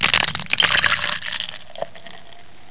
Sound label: beer pour